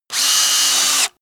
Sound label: screwdriver free